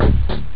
hard bass; Amiga Soundtracker sample

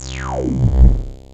SEQUENZER, TWAV BASS; Soundengine - CSR-000 The Art of Transwaves

